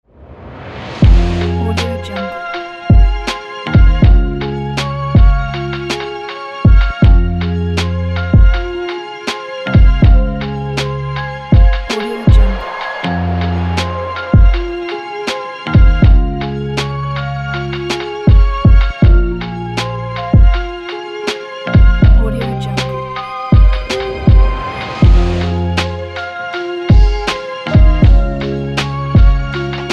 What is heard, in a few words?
lofi